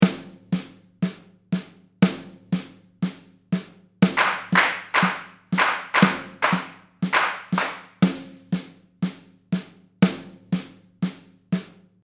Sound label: 4-4 clapping out of time